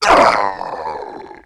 DROWN; gunner

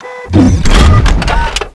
pain

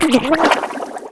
DROWN; unit00

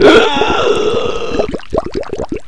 DROWN

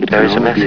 DROWN; openffa; hal

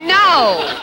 gurp; openffa; Wilma

